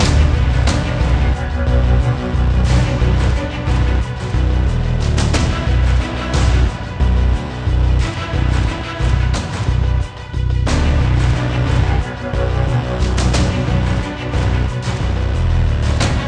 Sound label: Industrial Combat; LAYER 4